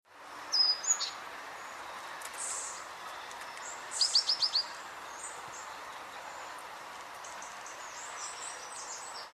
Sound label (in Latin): Parus major; SETE